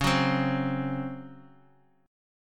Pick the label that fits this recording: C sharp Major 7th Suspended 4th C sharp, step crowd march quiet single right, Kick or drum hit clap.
C sharp Major 7th Suspended 4th C sharp